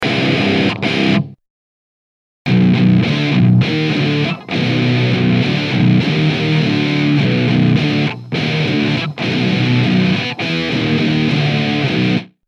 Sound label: Guitar